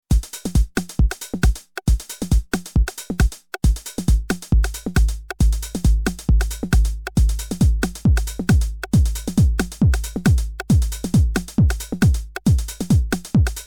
TR8 909 Kick Snare tweak

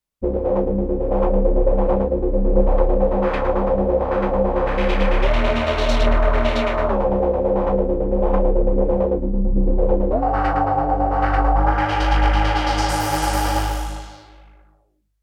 microfreak V4 User Wavetable

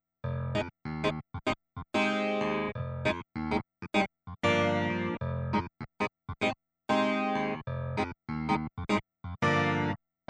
Concert Clavinet; Numa

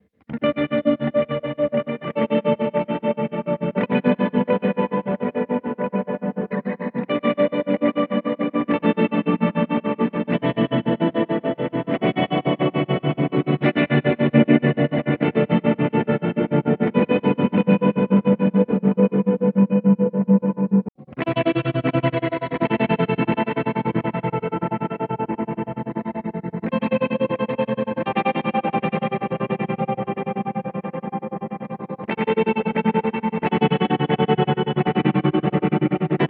Spring Tremolo; Red Panda Context V2

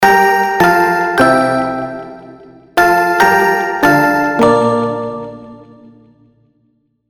Dubstep Electro; Nexus 3; 4 Expansion Amber

